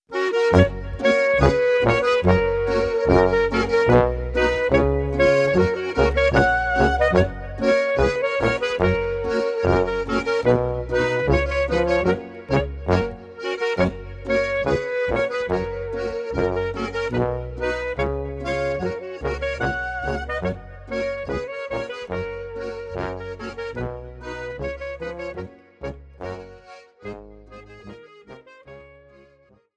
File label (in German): Boarischer